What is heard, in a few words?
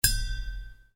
UI Quirky